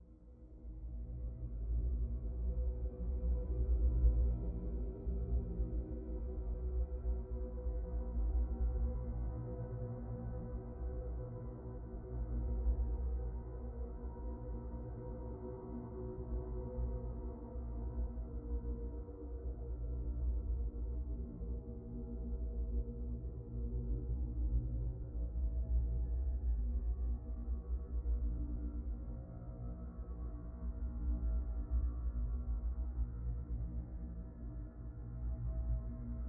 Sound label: Test Room Ambience